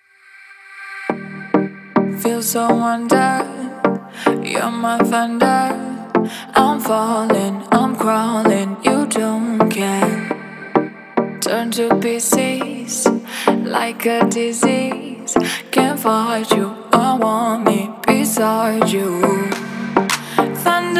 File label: slowed reverbed